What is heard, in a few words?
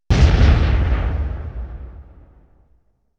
Explosion, 5.1 Surround